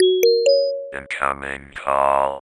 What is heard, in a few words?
incoming call; ringtones